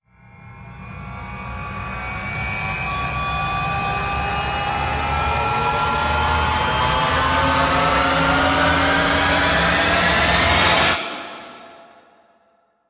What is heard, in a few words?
Rise Turbothrust